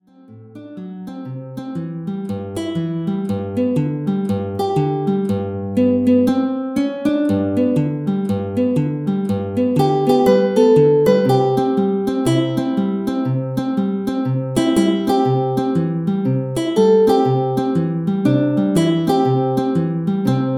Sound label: Ripple extr synth